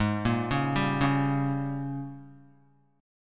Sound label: G sharp Major 7th Suspended 2nd Suspended 4th, Guitar; arpeggiated; chord; 3,1,1,0,1,x